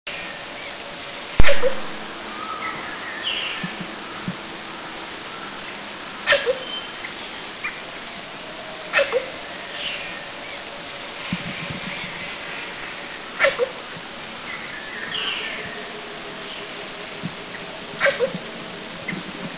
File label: Philippine Coucal; Philippines